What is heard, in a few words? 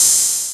hat open high sizzle, Chicago House Drum Hits